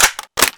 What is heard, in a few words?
new clip in, m4a1; weapons